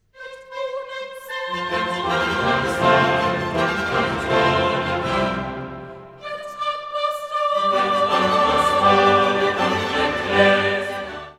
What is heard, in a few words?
Credo Et unam sanctam